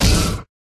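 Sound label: flying armor block; letaushii dospeh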